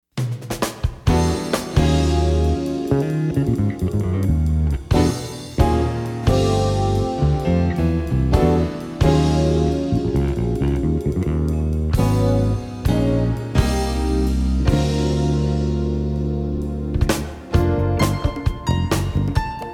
light jazz

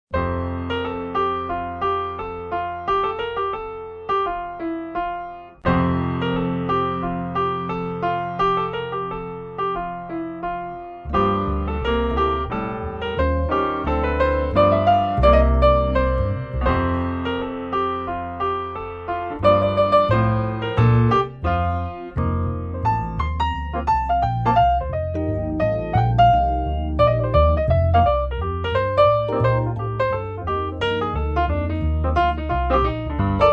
holiday deck the halls piano

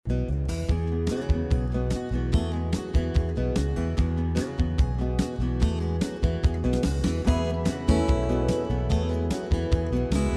country

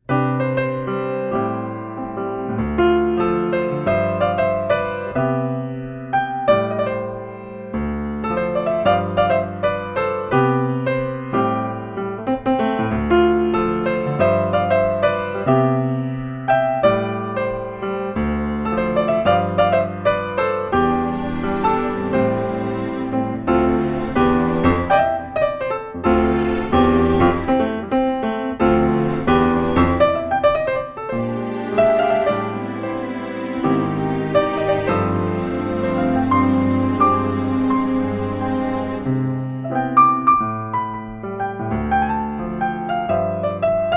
holiday happy piano

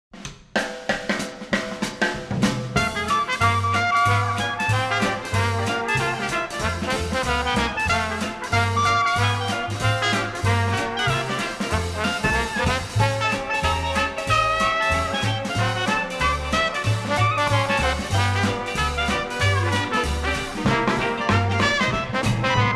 Cajun

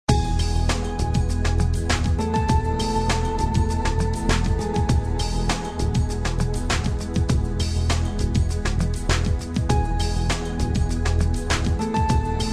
Light Rock

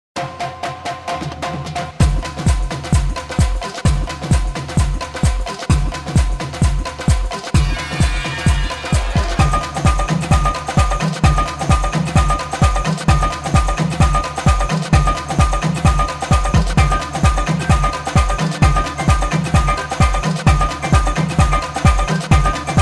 contemporary urban pop

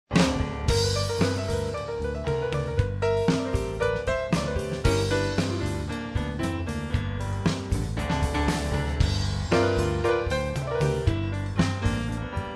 Cajun

